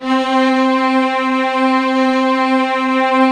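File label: U220 string; Greytsounds; Scott Peers Sound Engineering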